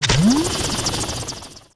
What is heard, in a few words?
Enemy Stamper Fly; Disney Star Smash; Android, iOS, Mobile